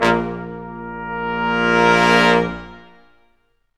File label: BRS QUIN S, Quintet sfz; Roland L-CDX-03